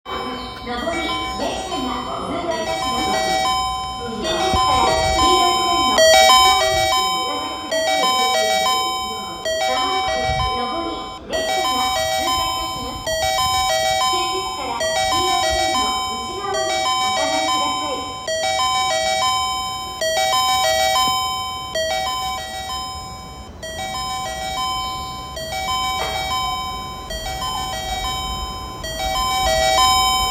京急本線青物横丁北通過予告, train sounds

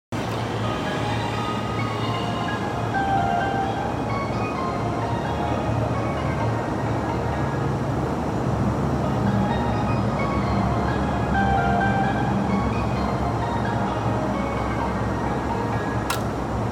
JR京葉線新習志野東, train sounds

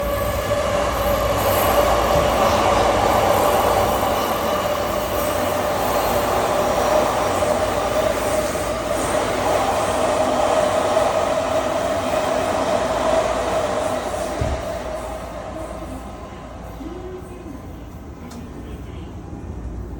JR中央・総武線西千葉西, train sounds

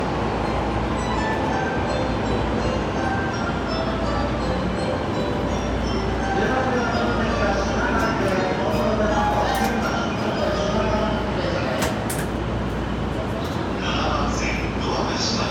JR常磐線東京北, train sounds

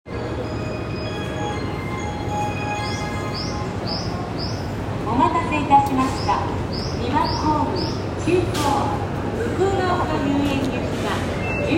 接近, train sounds; 小田急線登戸西

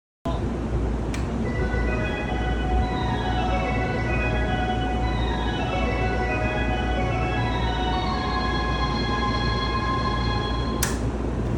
京浜東北線大宮南, train sounds

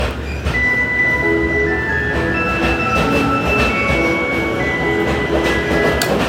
中央・総武線中野西水道橋西, train sounds

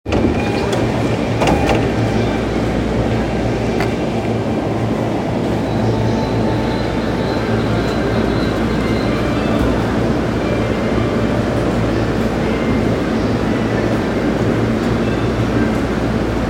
JR東海道線辻堂北, train sounds

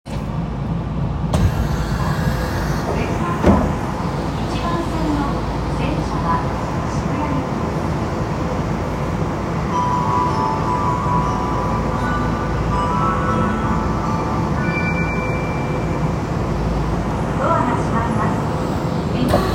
銀座線末広町西, train sounds

